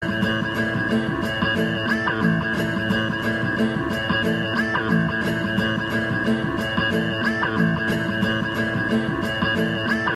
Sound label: new message ringtone